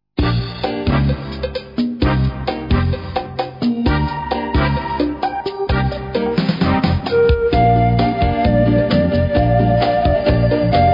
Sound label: Cha Cha